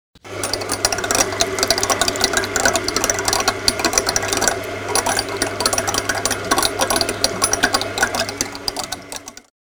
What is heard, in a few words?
Ibis